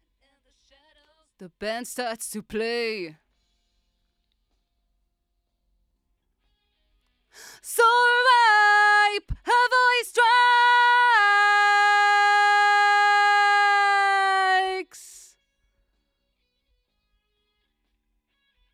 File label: Vocal